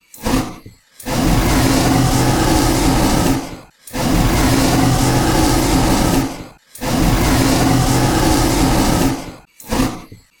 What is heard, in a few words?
flaming gauntlet, loop